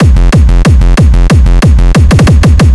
Kick Bass Mega Tek F

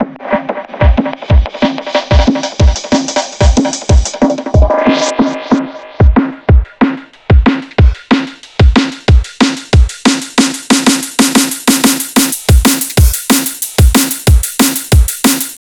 amen

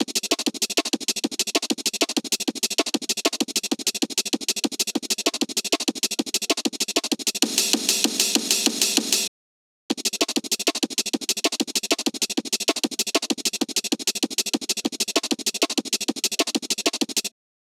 GATED

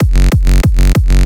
Kick Bass F

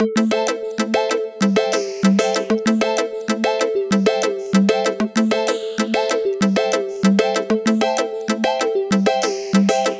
dream skank spiral melody

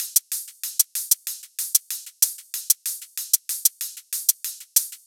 top loop

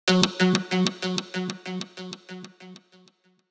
Plucks

